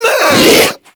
Infested Diseased Ancient Breath; Warframe

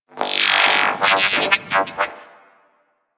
Gas City TV noise; Warframe; Corpus Gas City; Props Remaster

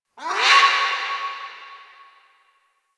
Bird Ruckus Vocal; Warframe; Quests; Entrati Labs

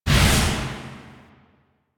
Scythe Proj; DE; Warframe; Weapons; Archwing; Melee; Exalted Archwing Scythe

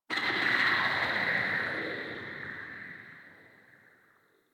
Interior Cave Texture Rock Movement; Warframe; Infested Eris; Misc Indoor

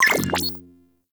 UI Default Theme PM Received; Warframe

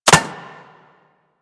Bullet Impact Metal; Warframe; Weapons

